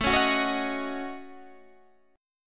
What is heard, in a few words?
A 6th Flat 5th, Guitar, DADGAD